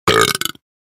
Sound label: new engine